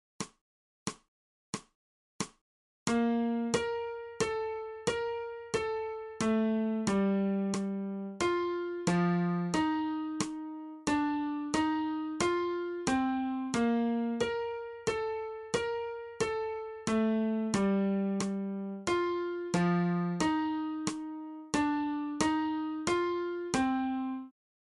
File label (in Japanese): 新フレットレス